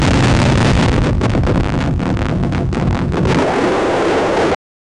electrical sparks sharp, glitch

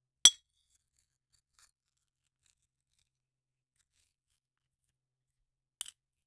cutting an apple out of, glass

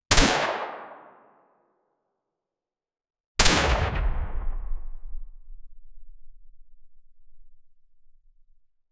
mpx sd 9mm subsonic singl

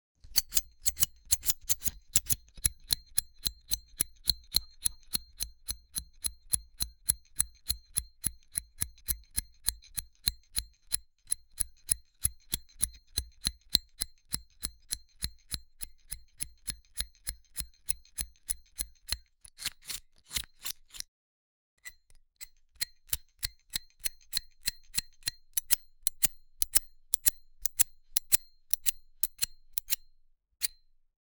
asmr haircut simulation

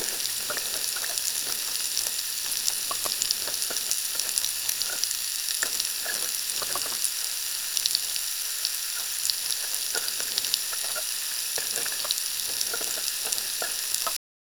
loop sound of cooking in, baking

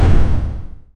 explosion

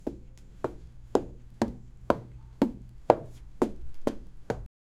the sound of womens shoes, fashion

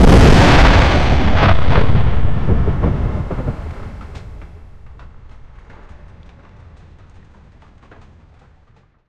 sound fire cannon ship

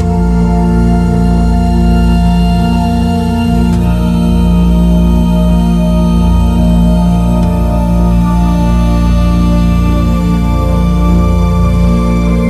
create looping ambient mu, menu

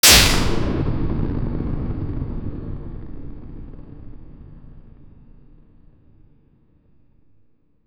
plasma blast firing, energy weapons

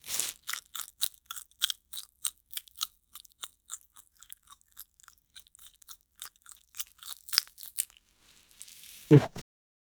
realistic sound of someon, bite

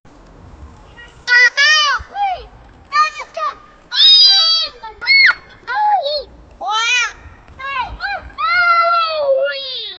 a young kid playing socce

